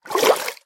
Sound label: swim, liquid; ayuncraft-1.5